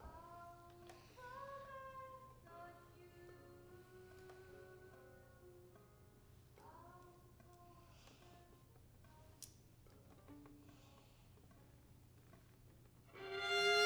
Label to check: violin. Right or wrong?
Right